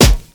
00s rich lows hip hop steel snare drum f sharp key